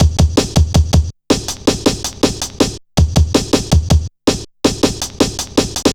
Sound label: Boy, Drum Loops, Jungle, 161.5; Zero-G Jungle Warfare 1, 2 & 3